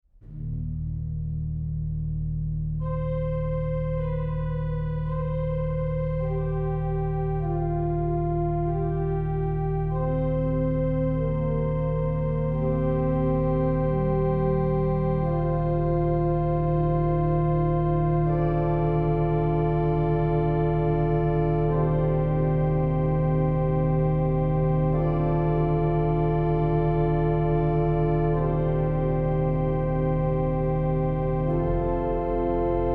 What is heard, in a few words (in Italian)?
elevazione; Carlotta Ferrari; organ